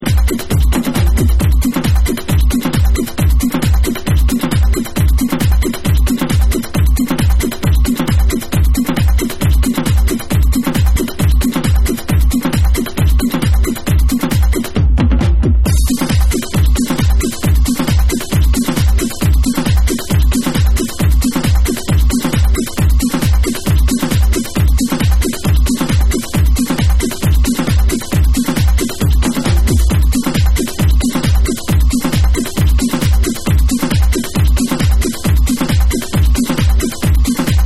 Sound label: Tribal Beatings